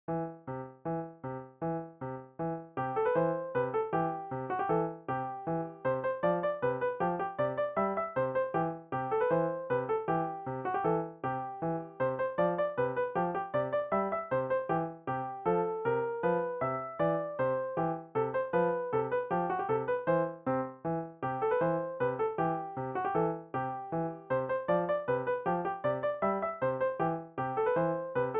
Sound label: chinese dance piano